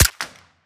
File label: fire, arccw sound; 22 sup; gmod; arccw uc commons attachments